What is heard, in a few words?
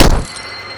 cgun shot; DoomRTX